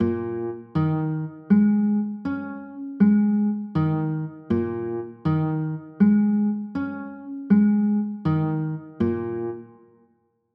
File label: b flat m, chords; b flat m11